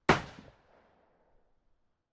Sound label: Weapons Firing Range Gun Large Rifle Single Shot Mid Distance; Rifle Sound Effects